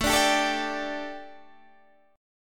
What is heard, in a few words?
A 7th Suspended 4th, chord; x,24,24,24,21,21,21,x